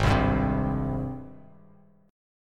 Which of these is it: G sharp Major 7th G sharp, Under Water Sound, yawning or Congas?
G sharp Major 7th G sharp